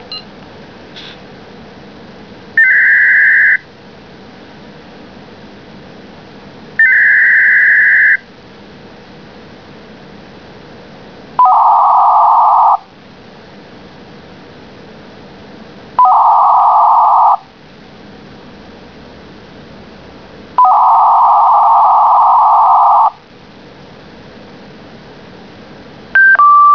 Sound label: PocketMail Nego